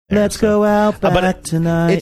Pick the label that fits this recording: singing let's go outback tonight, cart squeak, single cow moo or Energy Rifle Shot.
singing let's go outback tonight